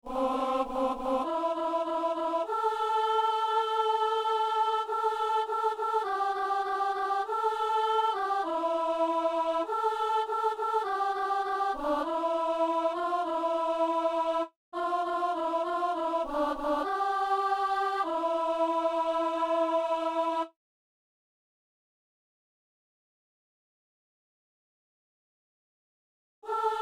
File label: alto; Louange eucharistique